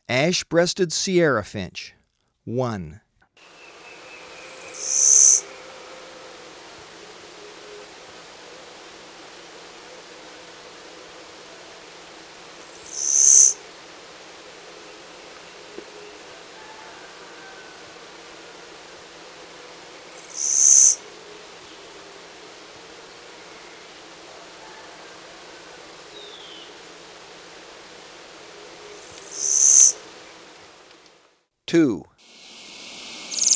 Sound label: ASh-breasted Sierra-Finch; S. America